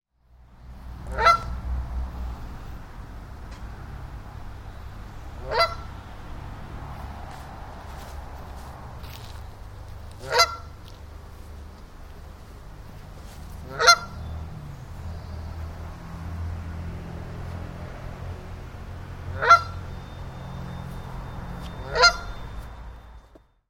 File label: canada goose